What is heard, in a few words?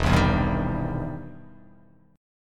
E Minor Major 7th Sharp 5th, E, down